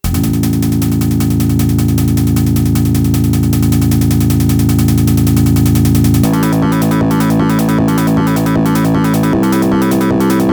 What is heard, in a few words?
vibra16c; asus i-a16c